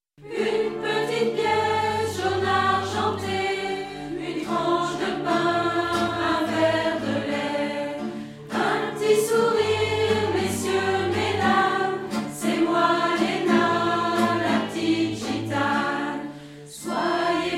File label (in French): accordeon